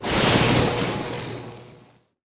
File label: Magic Electricity